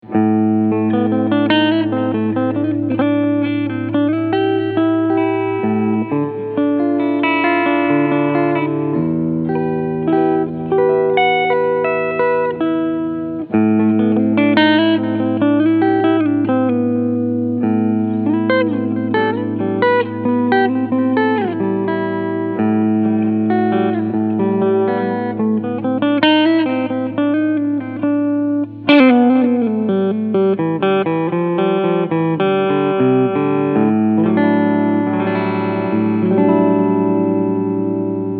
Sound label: Solar Guitars GC1.6FAB Clean Sound Front Humbucker